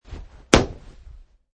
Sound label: Landing Wood